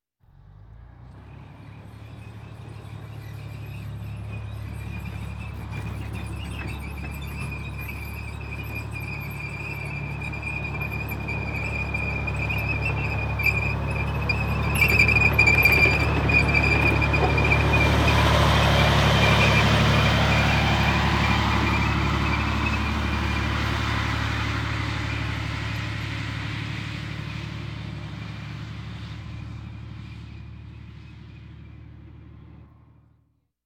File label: Military vehicles; Valentino Production Sound Effects Library CD50